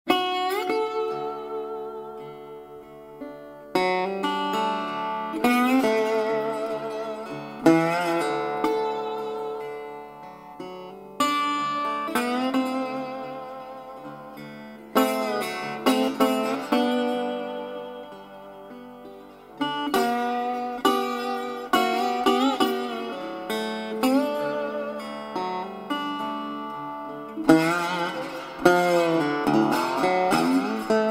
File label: Tricone, Delta Resonator Guitars